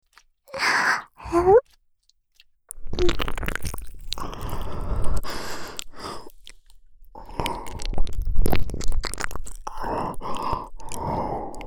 超・超!! ぐっぽり耳舐め!!; 生意気ドスケベ家出ギャルJKと子作りえっち！?

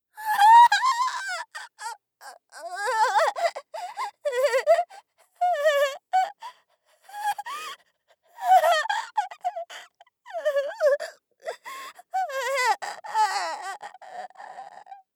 混乱して訳が分からず泣く; 効果音なし

